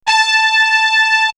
brass ensemble, horn section; X-Static Goldmine; backtothe70's

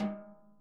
SNARE, Interactive Drum Kits

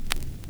RECORD, Vinyl Scratches; E-MU Producer Series Vol. 8 – Platinum Phatt

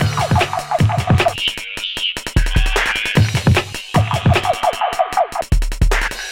Hardcore Breakbeat, 152 Dmin; Big Fish Audio; Hardcore Breakbeat Action; Gone Insane

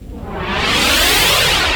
NOISE, Production Effects

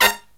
LOW CHORD, Killer Brass Riffs

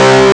guitar, Amiga Soundtracker Sample; Amiga Soundtracker Sample Packs

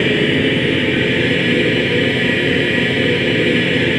clusters, voices

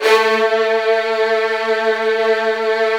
HDS A1, Virtuoso Strings; ILIO; Kirk Hunter Virtuoso Strings VOL-1

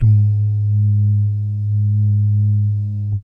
BASS DUMMS, Vocal Planet Jazz FX; Spectrasonics

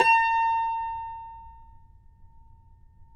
Cumbus A#5; Spectrasonics; Hans Zimmer Guitars VOL-2

